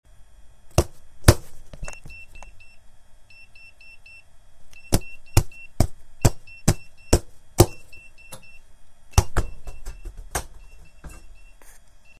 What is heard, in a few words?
beeping foam ball